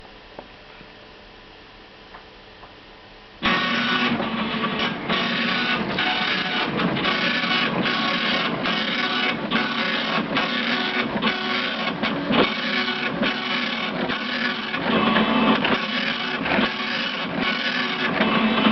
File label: 80s telex machine